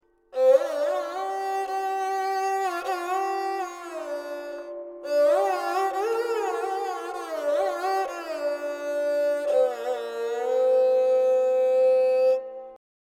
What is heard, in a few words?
dilruba